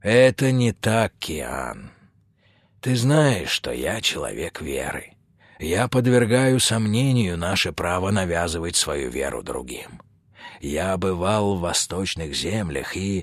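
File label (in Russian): garmon, accordion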